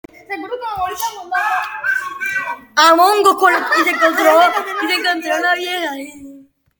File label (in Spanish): risa